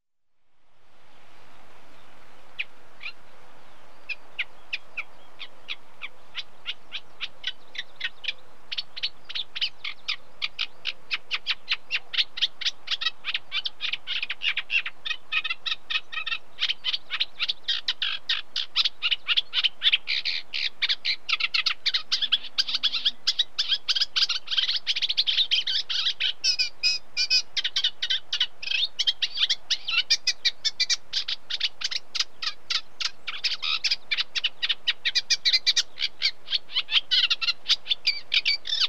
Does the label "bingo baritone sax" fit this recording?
No